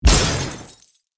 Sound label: Notifications